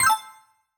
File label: confirm, tones; inteface sfx pack 1